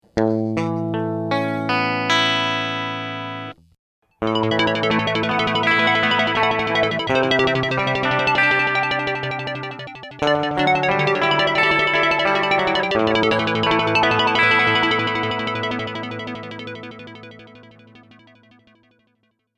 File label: Clean Guitar with Arp Synth